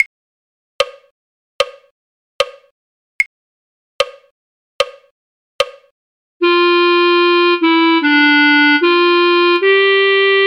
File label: clarinet; folk